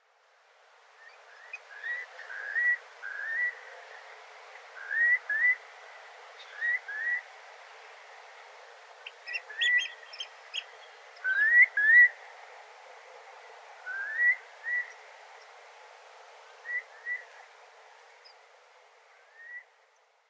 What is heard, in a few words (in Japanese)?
daisyaku, mie